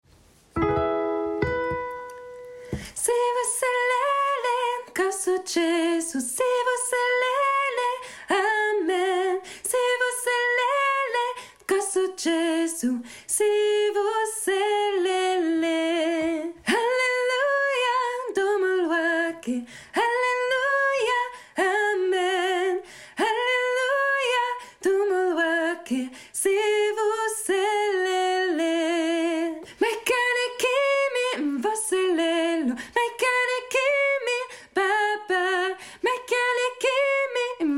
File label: sivuselele sop